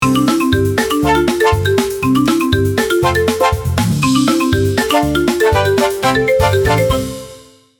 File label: Tropical slot machine casino win combination